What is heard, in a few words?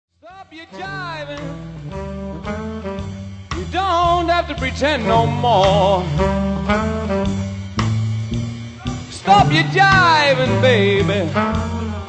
Back breaking blues